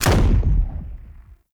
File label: Gatling Gun Start Stereo; Warframe; Tau Gatling Gun